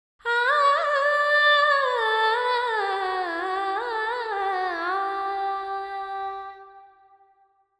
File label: Verb 4-4 G 100, Vocals Female